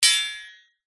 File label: impacts metal thin bar hit with metal